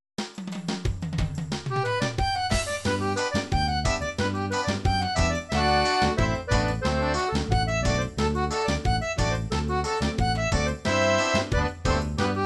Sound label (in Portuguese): sertanejo e samba